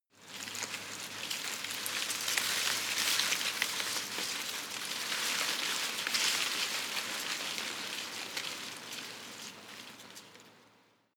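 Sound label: tree small leaves rustle soft, garten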